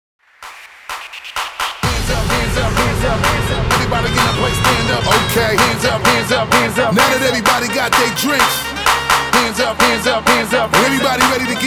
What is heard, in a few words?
bootleg, 128 BPM